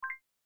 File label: Video Record, ui; Mobicel Onyx